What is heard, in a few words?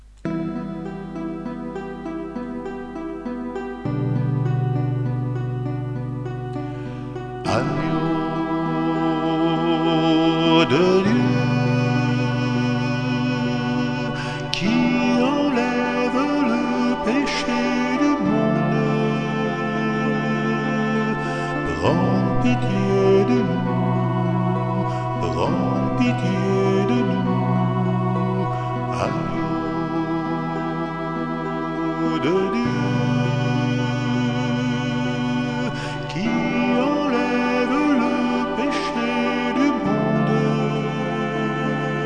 solo, chorale; Agnus